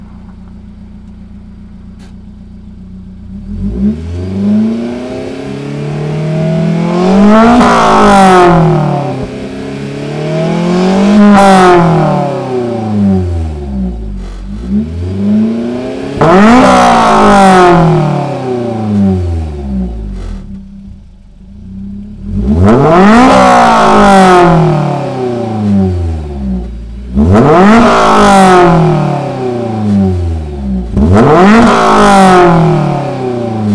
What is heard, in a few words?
rev; s2000; s2000 modifications parts